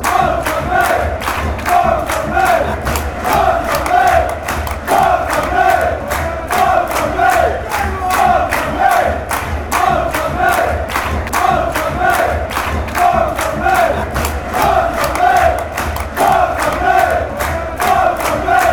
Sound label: chant; anderlecht